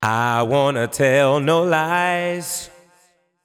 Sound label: deep house male, 125, A, vocals